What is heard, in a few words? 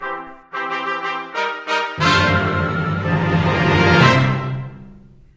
Tournament End Jingle